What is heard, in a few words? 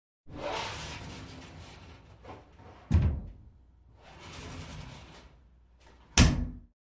Shower Door Slide Ope